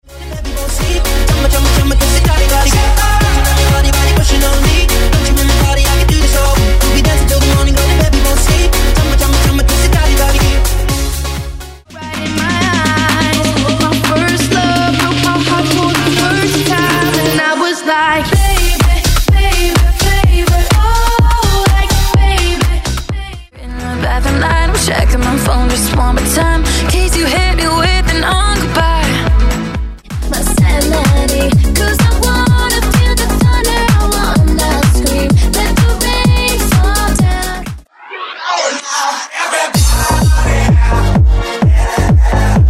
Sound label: Pop Top40 House Megamix